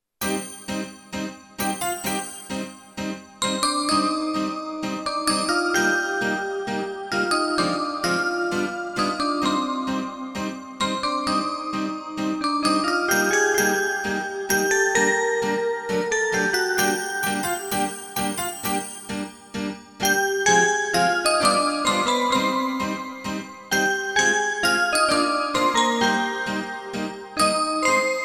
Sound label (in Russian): Мелодия на часы; 7 ноября